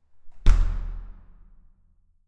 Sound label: entrance door close extremely hard